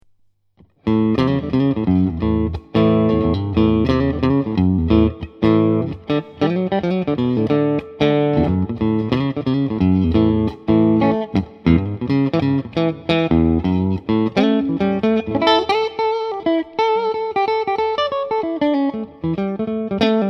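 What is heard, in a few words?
twang bar middle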